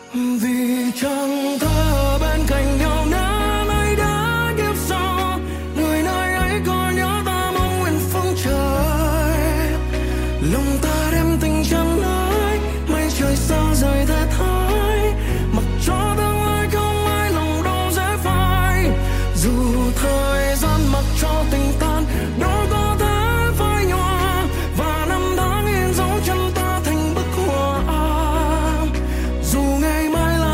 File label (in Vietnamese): Nhac Chuong